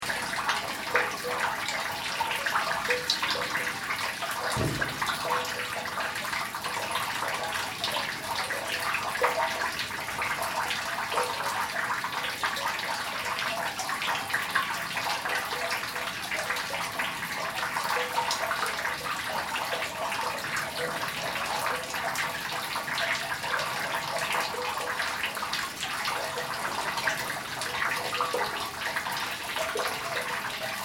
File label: Water dripping, murmur down a well. No rain. Occasional voices and distant noises.; LYN101 package of City Sounds; Lynne Music